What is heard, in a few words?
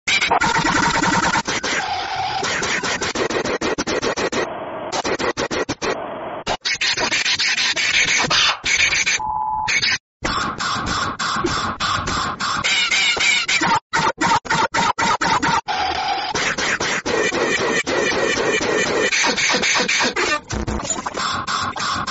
jumpscare; every fnaf